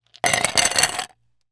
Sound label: food drink ice drop into glass; martinimeniscus